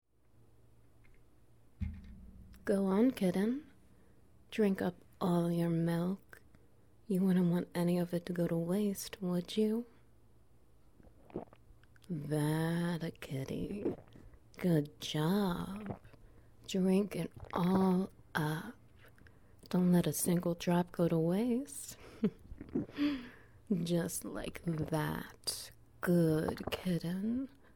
SFX Wet Noises; Three Rounds

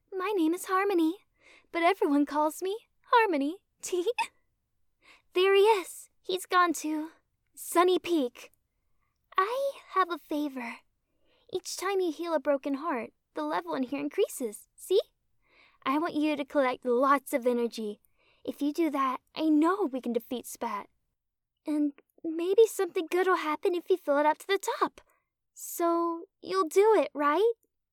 harmony

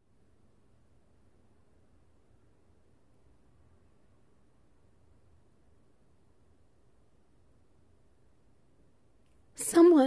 Wet Noises

